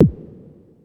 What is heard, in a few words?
Lazer Tom, Ensoniq ASR-X Pro